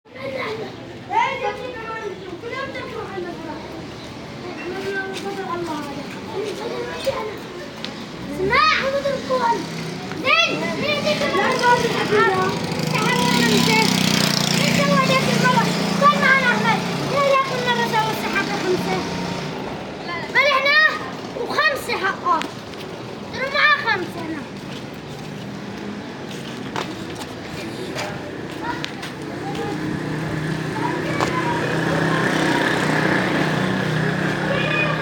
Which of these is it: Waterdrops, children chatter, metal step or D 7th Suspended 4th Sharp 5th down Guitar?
children chatter